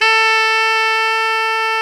SAX TENOR; Roland LCDP07; Tenor V-sw; Tenor 4way

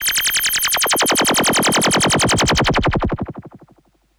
Classic FX; radiosonics; Classic Simple OSC FX

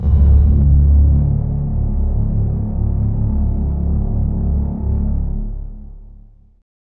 FX SWEEP; SYNTH FX; SYNTH SWEEPS

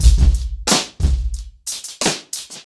90BPM, acoustic drums; The Roots Drumloops

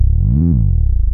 DD BAS, Disco House

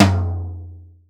TOM, DRUM KITS; InVision Interactive; 40 Oz Phat Slammin Hip Hop

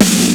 Snare, Fairlight CMI III

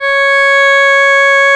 C#, Synclav FM, WIND; Big Fish Audio

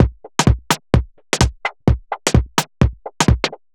Beat, uk garage

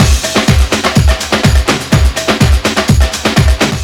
stomp, Deep House, 125bpm

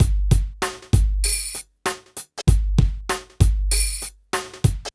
PHATT BEA, Hip Hop

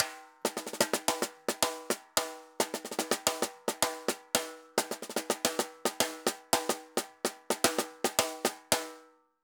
Tambor Murga 110 bpm; Best Service; Festa Latina VOL-1

